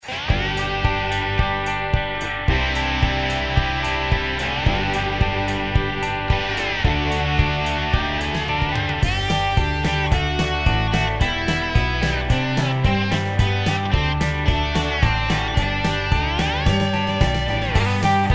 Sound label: OD Max Driver; Biyang